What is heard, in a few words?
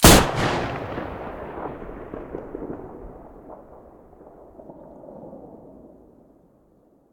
toz34 hole mono, weapons